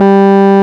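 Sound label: RMI piano G 2, EP; Sonic Reality - Vintage Timetraveler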